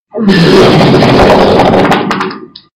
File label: slow splat